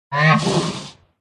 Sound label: rhino attack voice up